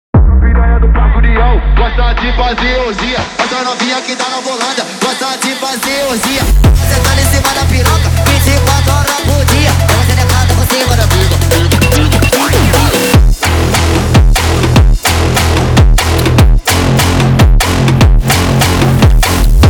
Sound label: Phonk Sped Up